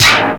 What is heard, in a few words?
Zappout, Drum & Bass Hits & Bits; Total Drum&Bass; 90 s Sample CDs